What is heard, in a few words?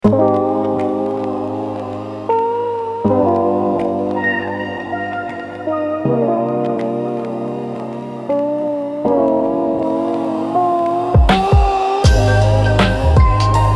Chillout, Lofi Hip Hop - Mellow Rain